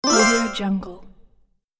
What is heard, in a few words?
obtain item